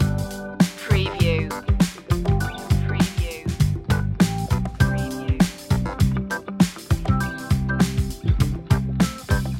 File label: easy funk comedy loop